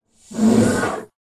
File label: Swan Giant Breaths